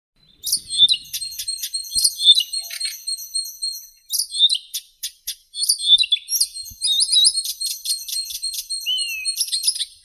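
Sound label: blyth's reed warbler, acrocephalus dumetorum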